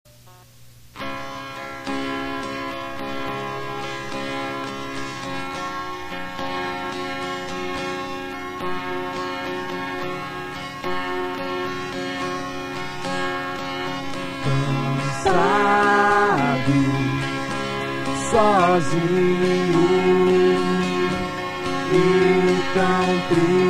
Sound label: cellos